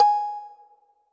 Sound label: Kalimba G#4